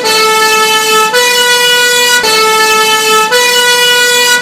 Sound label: French sirens; ccfm